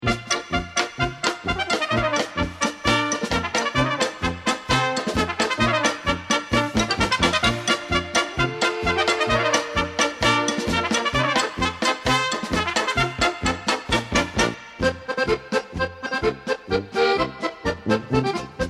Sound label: German Band, Polka